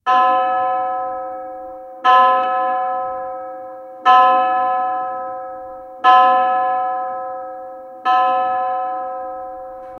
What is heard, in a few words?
large church bell ring on